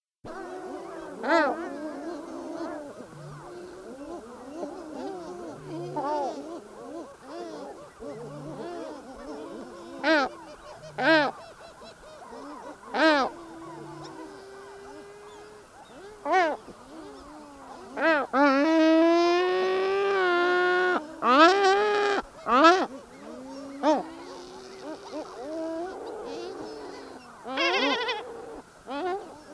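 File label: Pallas's gull voice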